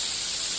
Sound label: spray